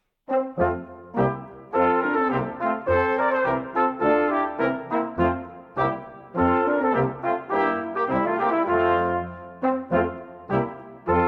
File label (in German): Alter Jägermarsch